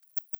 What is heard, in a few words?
Pipistrellus pygmaeus; europe